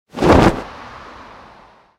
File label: movement flying whoosh